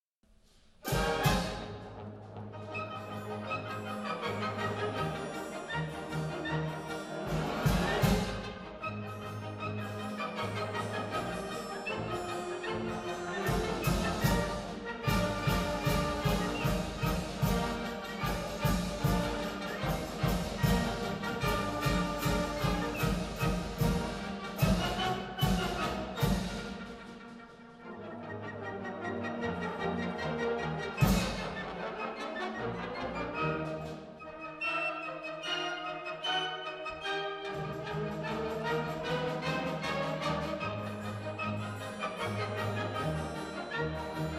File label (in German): Tritsch-Tratsch-Polka; J.Strauss; arrgt. J.Devogel